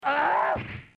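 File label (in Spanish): grito, scream; scorpion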